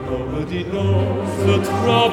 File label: a spiritual, chorus & solo